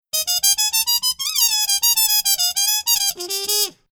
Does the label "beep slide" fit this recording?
No